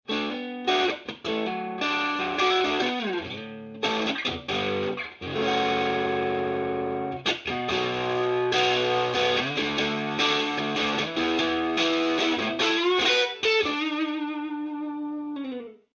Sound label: overdriven